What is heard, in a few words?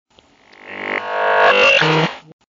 Cyber Alarm